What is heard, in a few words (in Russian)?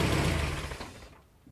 glohnushij na hodu lomayushijsya dvigatel